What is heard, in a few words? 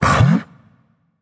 confused; smite; hachiman